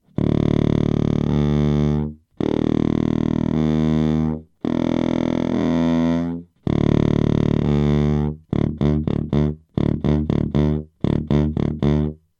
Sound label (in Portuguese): saxofone contrabaixo notas graves registradas para o agudo